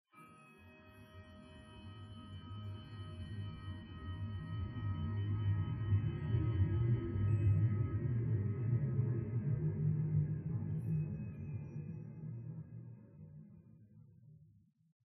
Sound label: Bells Interior